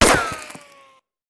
Bullet Ricochet; Sound Ideas; Guns

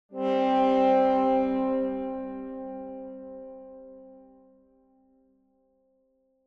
Tuba Tone - Duet, Lower; Hollywood Edge; sound designer's toolkit v2

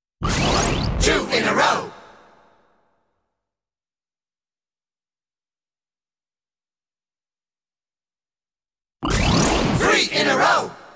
2 In A Row! ... 10 In A Row!, jingles

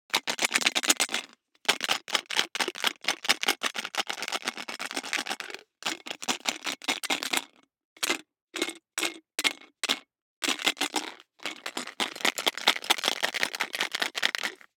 DICE in Plastic Cup Closed, Shake, Four Dice; Samples; ArticulatedSounds; DICE Sfx Party (Extended)

